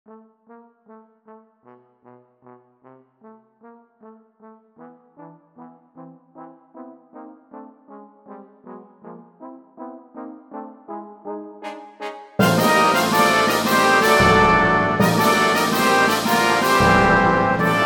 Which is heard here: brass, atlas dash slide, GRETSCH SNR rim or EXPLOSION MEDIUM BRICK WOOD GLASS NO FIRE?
brass